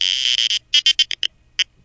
130 cellphone beat; SYNTH AND ELECTRONIC LOOPS

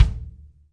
yam kick A2; REAL LIVE KITS

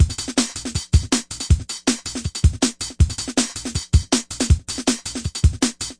160 bpm goober breaker, drum loops and breaks; 141 to 160 bpm

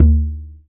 arabic perc, Arabic Percussion Kit; ETHNIC and WORLD PERCUSSION; Arabic Percussion Kit 3

